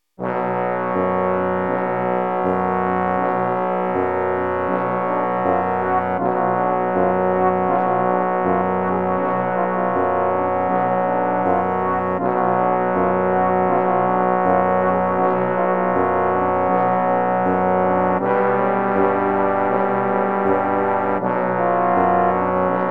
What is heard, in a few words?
Romanian Folk Dance